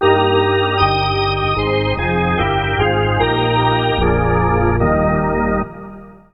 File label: Hockey Organ Oh Canada